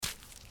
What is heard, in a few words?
biped run